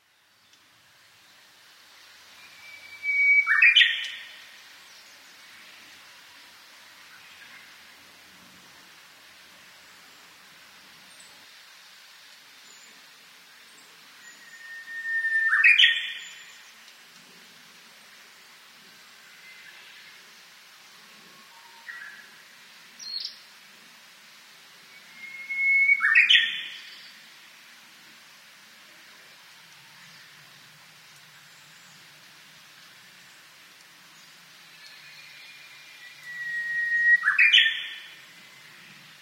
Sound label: uguisu; ウグイス